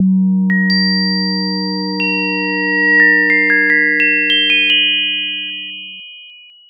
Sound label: bell; nyquist; pmorales